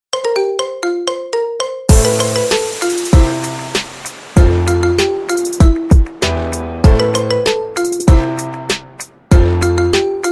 marimba remix

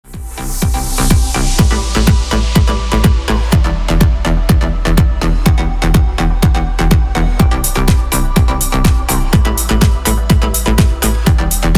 instrumental

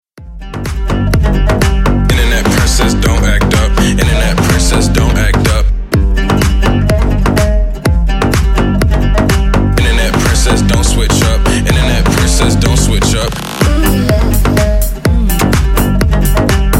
backing

